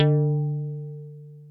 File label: HARP D2; Music Store; HARPOPHON